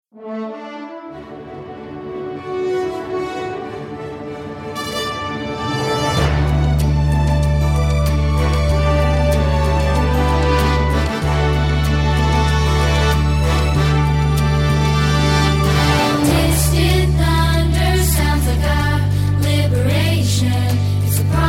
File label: Traditional Vocal